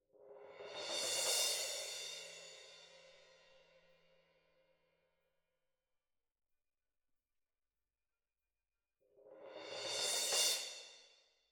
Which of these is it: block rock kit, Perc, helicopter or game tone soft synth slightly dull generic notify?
Perc